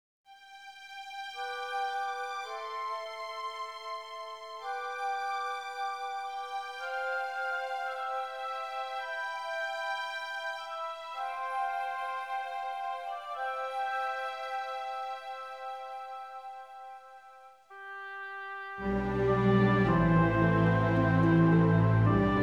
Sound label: Village; anno 1503 gamerip